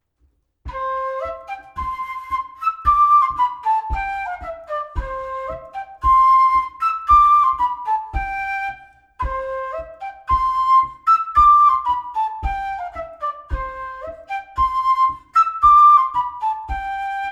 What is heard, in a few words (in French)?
Bourree ancienne; Rec Chateau Bertrand